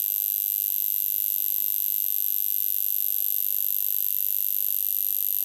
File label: light switch buzz electrical loop; household